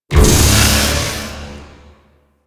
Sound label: Beam; Enemies; Betrayal Beam; DE; Warframe; Warframes; Pax Duviricus